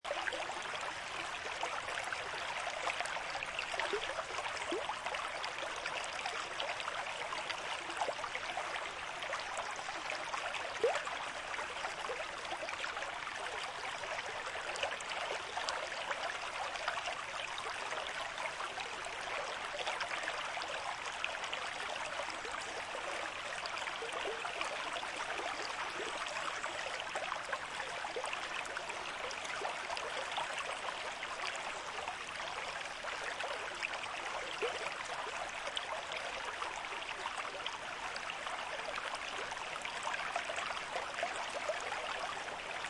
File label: river stream moderate flow